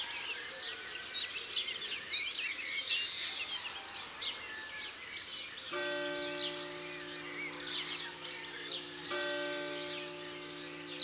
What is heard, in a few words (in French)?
Serin cini et cloches de l'abbaye, Concerts naturels de l'Isère; Studio Les Trois Becs